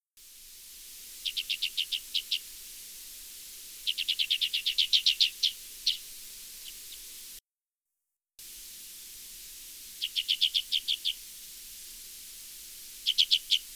Alpine Accentor Prunella collaris; Ladakhi name:Tsildir

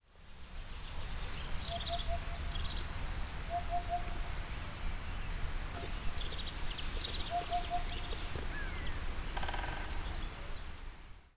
Eurasian Hoopoe Upupa epops, Ladakh; Ladakhi name:Hutu-tusay, Utu-tutse,Pupuk Shele

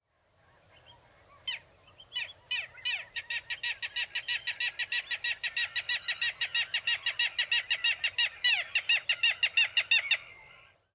Little Gull Hydrocoloeus minutus, Ladakh

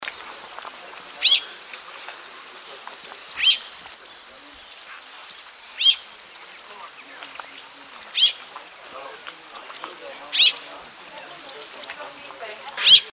Chinese Rubythroat Calliope tschebaiewi; Ladakh

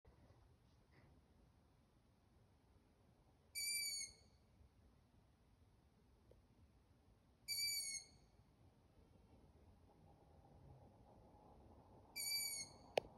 Long eared Owl Asio otus, Ladakh; Ladakhi name:Ugpa; Order:strigiformes; Nubra

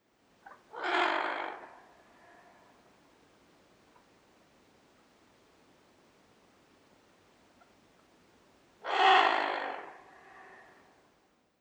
Tufted Duck Aythya fuligula, Ladakh

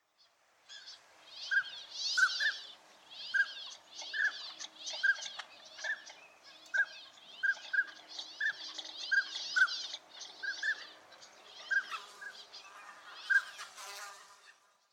Northern Pintail Anas acuta, Ladakh; Northern Common Pintail; Ladakhi name:Chubja rNga tep

